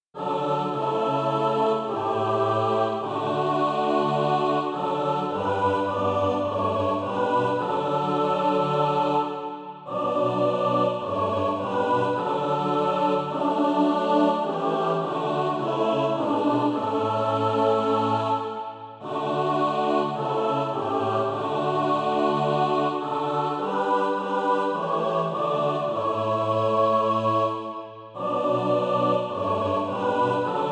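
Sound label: mixed, SATB Practise Music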